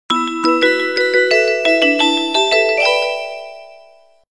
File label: Asian Bell; Sanyo SCP-6760 Incognito